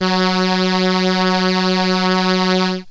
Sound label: Solina Trumpet F#2; Analog Synths; Ableton Foundation FreePacks